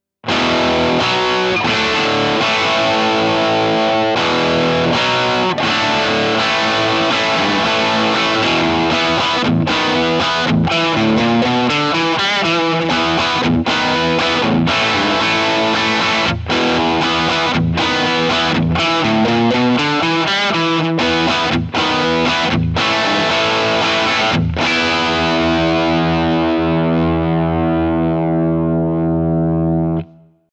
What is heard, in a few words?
rock chords; jensentone